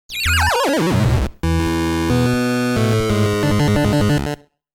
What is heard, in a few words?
Miss; Game Sound Museum Famicom; Donkey Kong 3